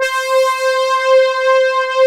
HORN STR; Pro-Rec - Synthsonic

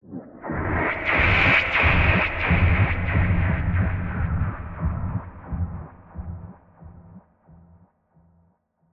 Swept Noise

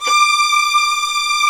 STR, Vls; Roland L-CD702

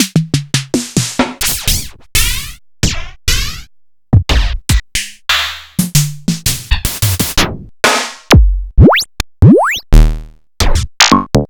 Quasimidi Rave-o-lution 309 dry snares

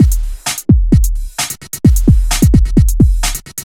130 drum loops; HertzDonut; M8; Electronisounds

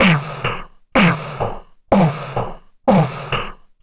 125 human drum, Vocal Loops; Transmission-X

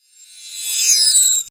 REV, SOUND EFFECT REVERSE; AKAI S6000 CD-ROM - Volume 1

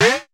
GLUNK, Prophet Five; E-MU Formula 4000 Series Vol. 3; Analog Odyssey

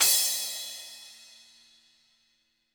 HiHat & Crash, Roland TD-20; HiHats

